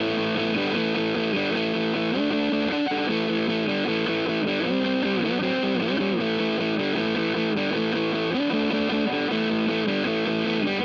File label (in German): Hagstrom Zerre Both; Pat Smear